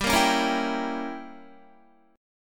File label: G Diminished 7th, Guitar; Gdim7 strummed; 14,13,13,15,16,15